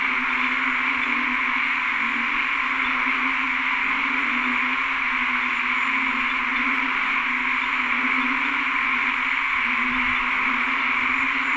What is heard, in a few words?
Kaloula pulchra